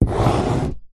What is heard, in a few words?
office pen ball point draw line short paper on plastic table